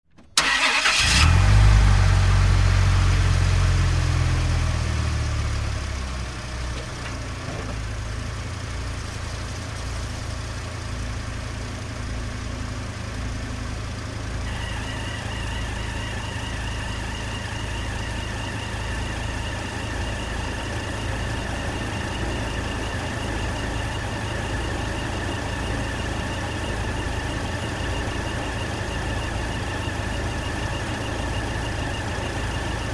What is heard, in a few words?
Dodge Ram: Start, Idle And Off.